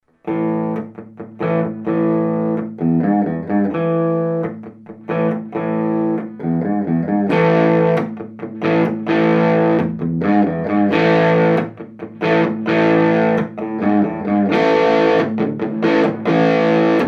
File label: primitive jet